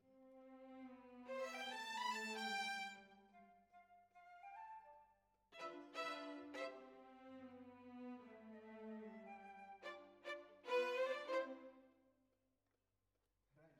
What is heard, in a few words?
Violins, jazz for piano